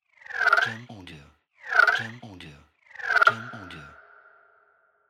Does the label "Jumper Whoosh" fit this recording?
Yes